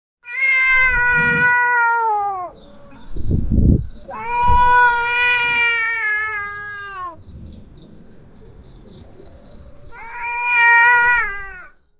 traditional african triba

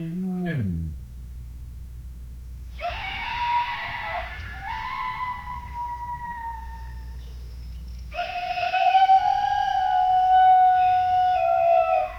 a distant chaotic chorus, yip

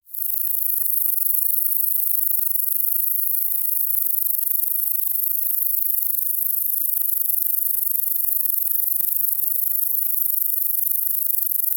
the sound of a hybrid, flutter

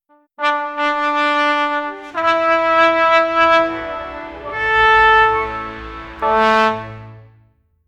a medieval instrumental, instruments

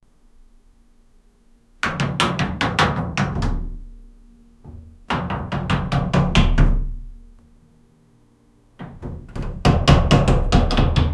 man pees on a metal; door

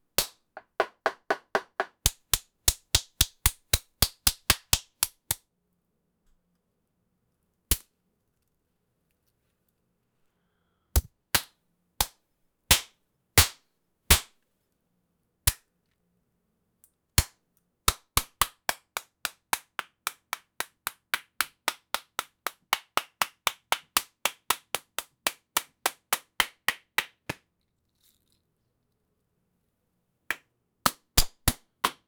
crack bashing splash

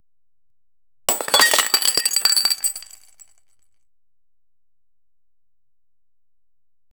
a realistic glass bowl of

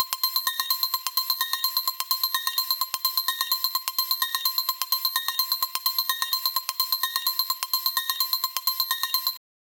casino reel sound high re, mechanical clicks

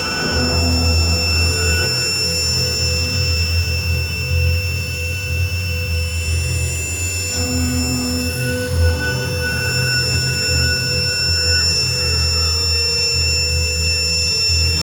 sound of friction between

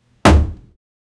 a loud sound from hitting, hard hit

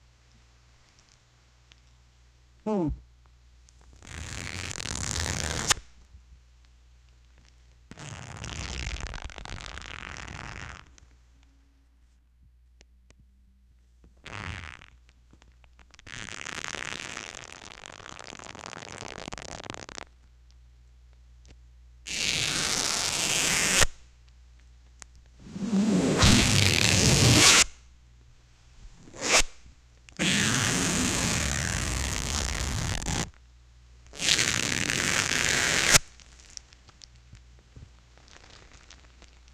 sound of a person rapidly, fabrics

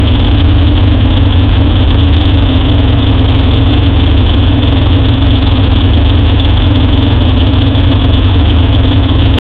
create a v16 engine sound

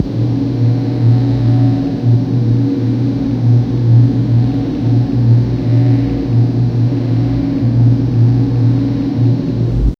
professional ambient room, warehouse

